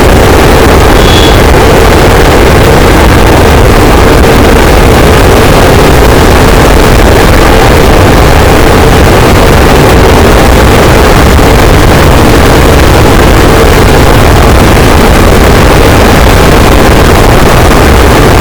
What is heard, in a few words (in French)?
cachalot negatif, boat, Physeter Macrocephalus